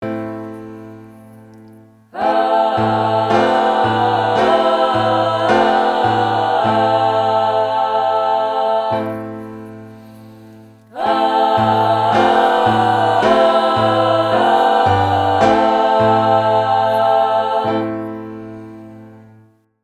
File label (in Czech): dvojhlasy piano a zpev